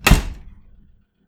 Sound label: Oven Door Full Close; Cooking; Turkey Cooking Simulator